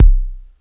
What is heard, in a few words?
KICK, Hip Hop